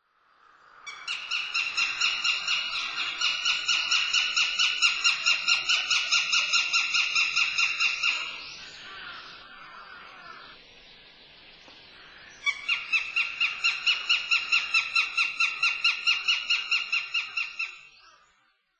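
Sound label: Northern Goshawk, fujioka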